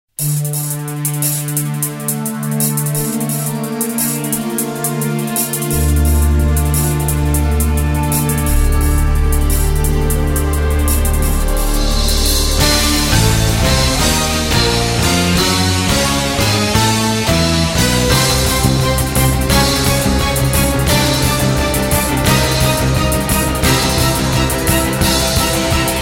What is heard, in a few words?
battle background music